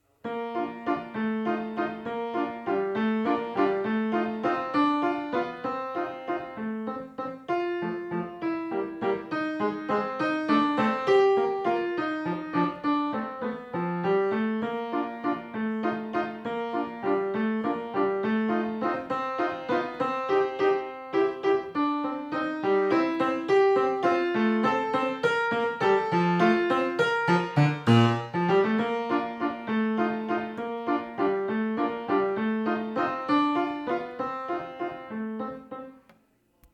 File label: Sleeping Beauty Waltz